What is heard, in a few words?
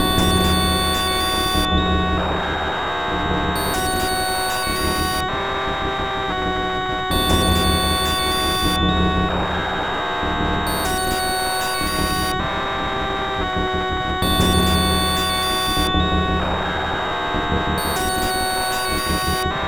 ring, transmission state; telephonebrain